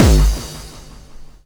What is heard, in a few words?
KICK PRO -R, Disco House; USB Soundscan vol.20 - Fresh Disco House I